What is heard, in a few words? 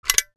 Military hook clipping metal; Military Equipment